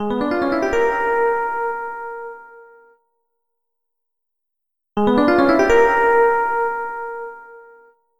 Click; the masquerade lullaby

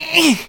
push; ice age scrats nutty adventure

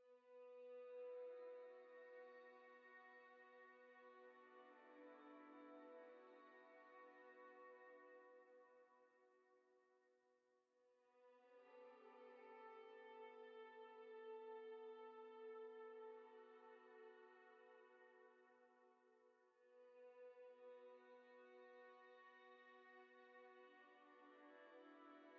violin; fatebinder; Tyranny Gold Edition

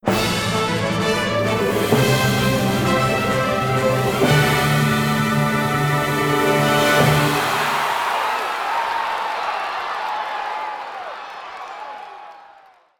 Victory!; Hex Gambit

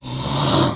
zombie snore attack; Voices

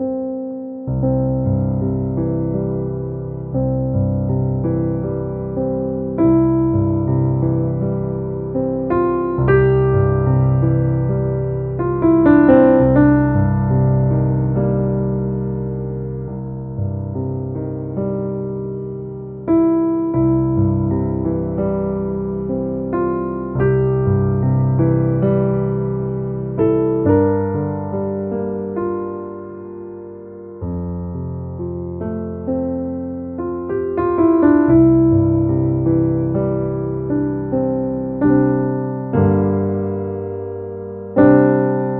Piano Sequence; mass effect 3 citadel gamerip 2013; Silversun Strip

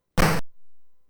Tandy Splat!; Lemmings

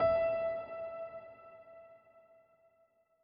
piano note; gamerip; Beacon Pines

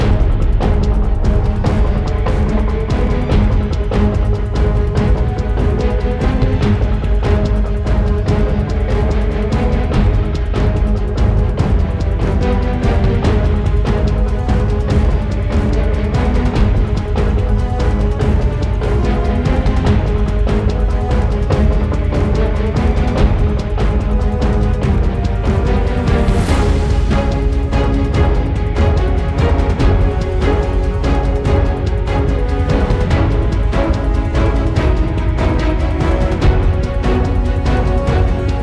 Combat Med Threat Loop

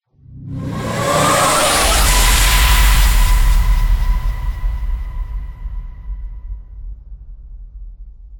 Transition; Everspace 2

